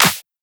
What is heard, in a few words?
clap snare; Anthemic